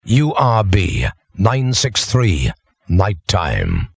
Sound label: jingle, no bed; nightime